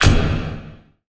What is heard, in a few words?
Industrial Hits; Antidote Advent